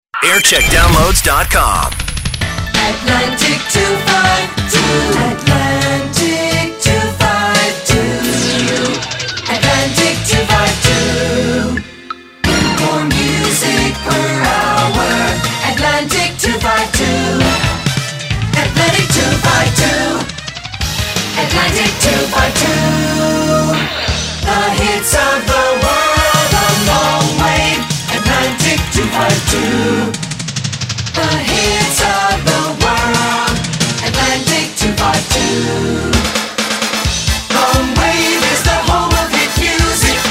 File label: jingles; Atlantic 252; Jingle Montage (Thompson Creative) 1989; 1980s